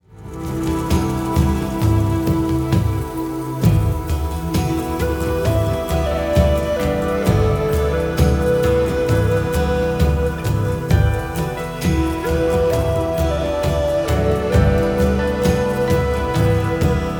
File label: Sacred Drum